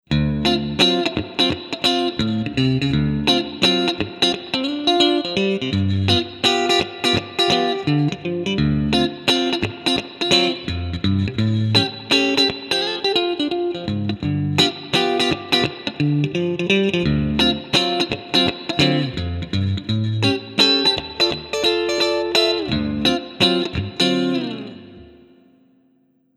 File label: Strat Neck + Middle clean